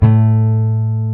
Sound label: GTR, Jazz Guitar; Club-50 - Foundations; Jaz-Kessel